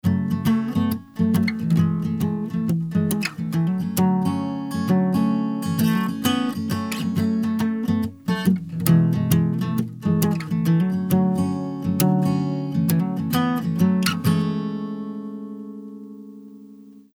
Guitar Sample No Peak Reduction

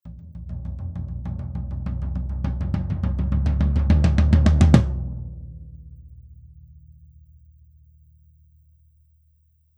Tom Roll

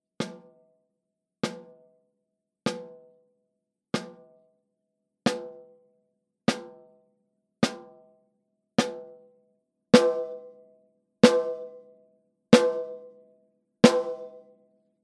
Fake SM57 Snare Hits

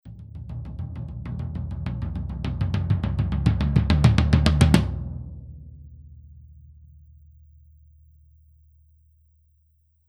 Fake SM58 Tom Roll

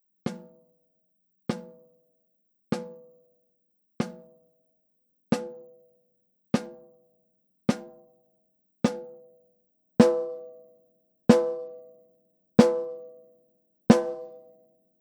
Real SM57 Snare Hits

